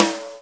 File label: snare